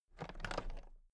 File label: toolbox latches open very soft